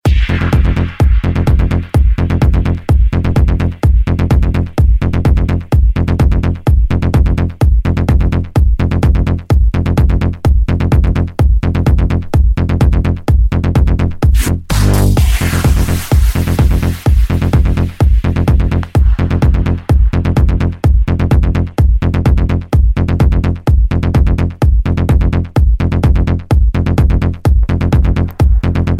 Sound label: progreesive beat ringtone